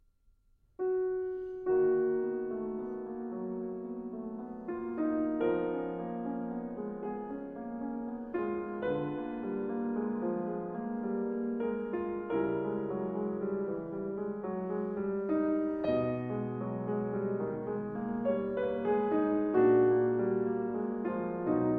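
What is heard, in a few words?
Étude op 10 No 6 in E Flat Minor Andante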